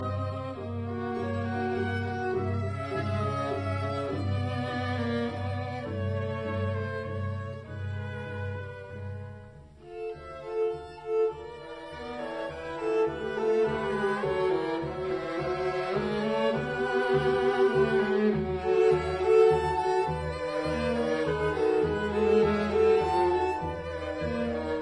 String Quartet No 4 Ruhige Viertel Stets fliessend; Hindemith; Op 22